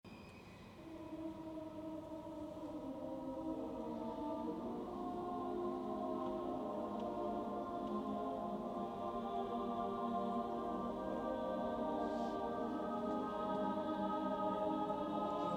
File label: a choral amen